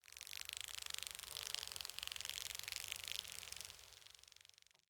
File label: Forest Open Glade Grass Hopper Fly By, Day; Ambience